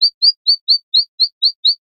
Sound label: bird chirp generic; animals